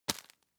tissue tap hard

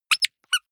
squeaker squeeze with release hard

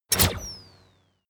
turn on off

